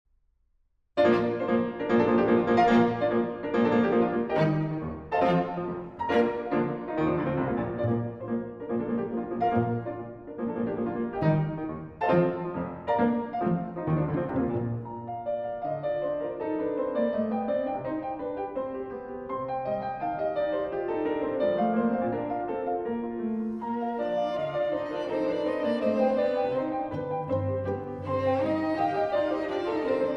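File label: piano trio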